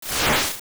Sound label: Sword Spin; Sword-spin attack